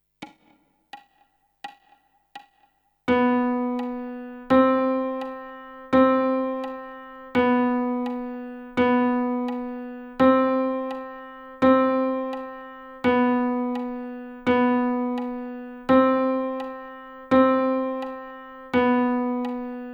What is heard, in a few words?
Playalong Chromatic Trainer F Flute full range, bansuri; playtalong tracks to train the chromatic notes on the bansuri